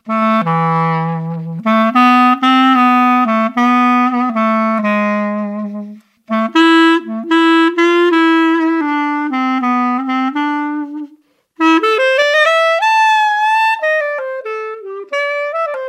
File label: Improvisation